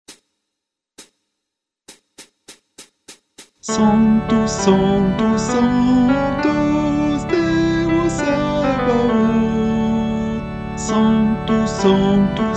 Messe de St Boniface Sanctus Guide Chant Tenor

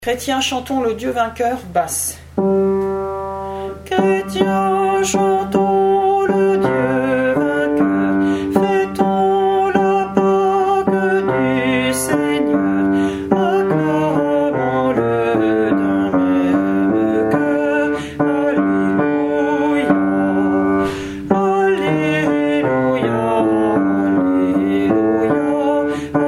BASSE; Chrétiens chantons

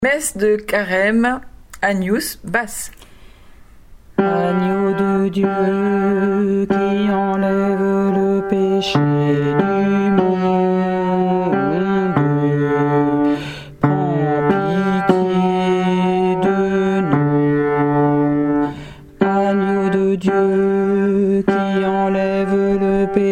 Basse; Carême Agnus

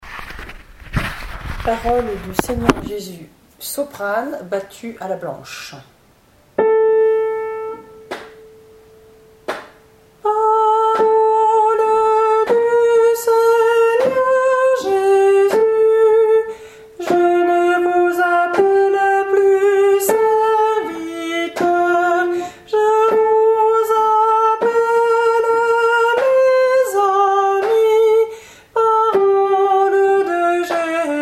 Parole du Seigneur Jésus SOPRANE

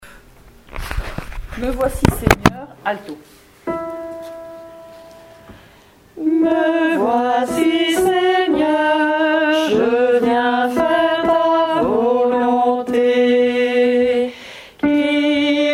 ALTO

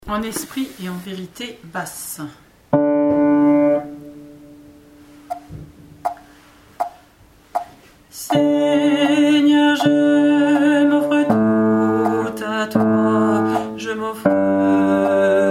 BASSE; En esprit et en vérité; chorale paroissiale; semaine sainte

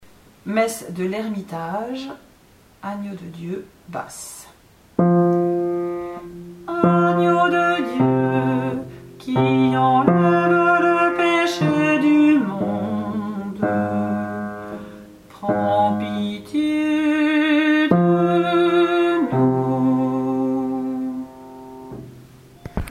BASSE; Agneau de Dieu MESSE DE L ERMITAGE

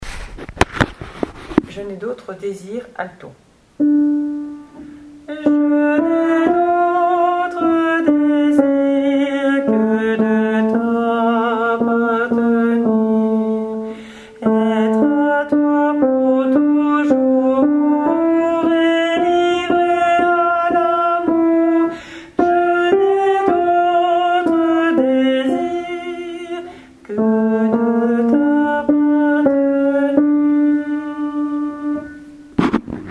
Je n'ai d'autre désir ALTO

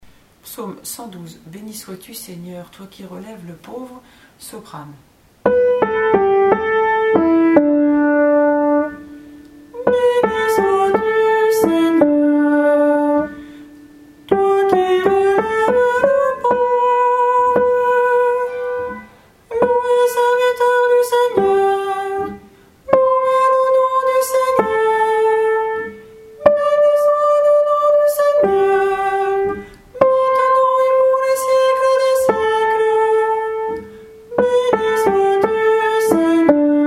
PSAUME SOPRANE; 112 FONSALAS béni sois tu Seigneur toi qui relèves le pauvre

